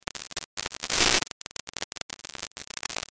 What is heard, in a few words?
STATIC CLICKS, Static Clicks Light Crackle; Production Element Imaging Element Accent Transition; Blastwave